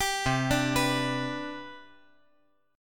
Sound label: C Major 7th Suspended 2nd C down, chord